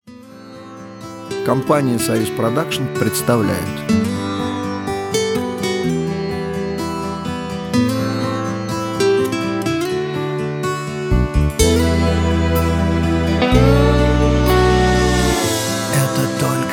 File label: JINGLES